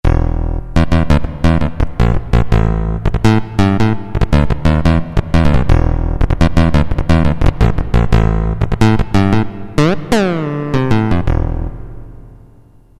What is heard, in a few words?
Syn Bass, Roland MT-32